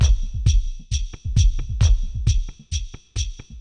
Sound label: thump 133; Loops